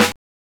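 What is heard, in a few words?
snare drum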